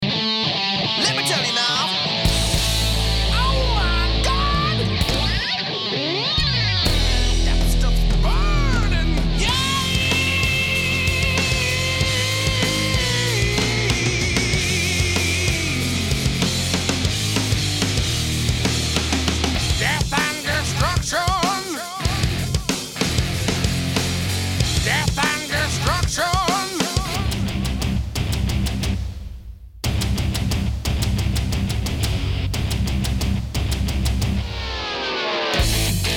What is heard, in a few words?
Rock Metal mix; Rock / Metal - Songs mix